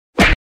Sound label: Punch Face Hit Sharp Impact; Fights Bodyfalls